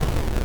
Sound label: Retro Game Explosion